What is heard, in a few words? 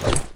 grenade throw; Soldat